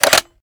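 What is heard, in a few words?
sniper reload fast mag out; gmod; iw8; alpha50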